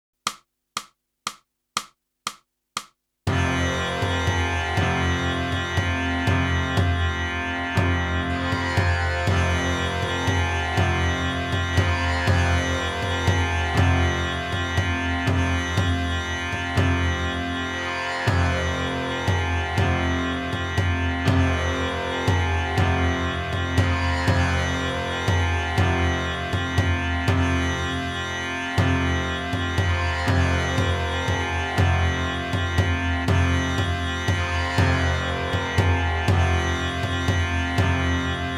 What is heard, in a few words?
sax